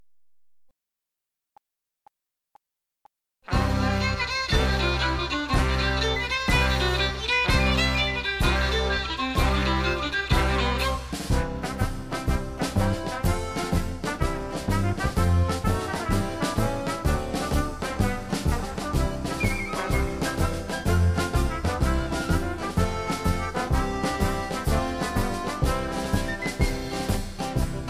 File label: Jazzy, mp3 backing track